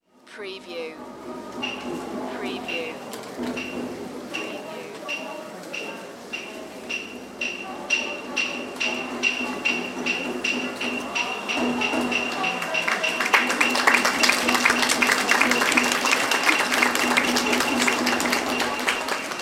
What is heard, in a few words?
street musician music applause Tokyo Japan; lynne-publishing